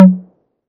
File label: tom high, 808 mini, drum machines